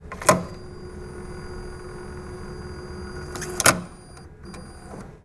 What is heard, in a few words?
cardinsert, atm